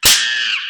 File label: spank hit; monkeyspank